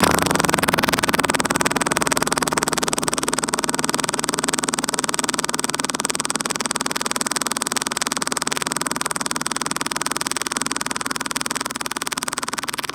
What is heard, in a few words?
Mouth Bass Long; Aawaz; Gully Loops